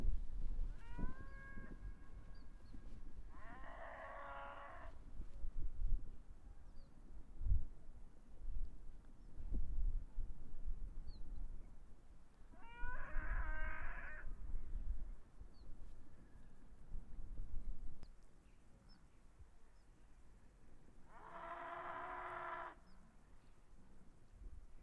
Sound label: Mongolia Tour Yaks; natural sounds